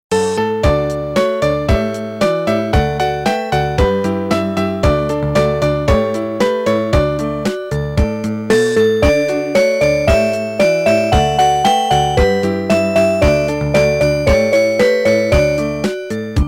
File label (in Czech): melodie